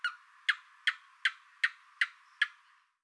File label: lizard